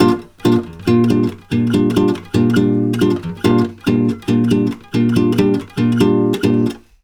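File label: bossa Bb, 140 major chord; USB Soundscan; AKAI; Brazil Bossa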